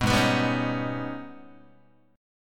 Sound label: Ab Minor Major 11th, Guitar; strummed; JGuitar